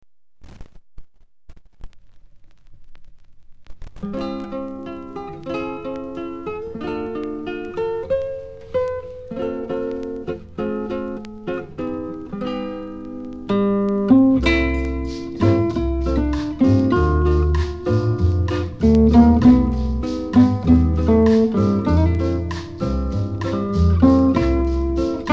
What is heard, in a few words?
bossa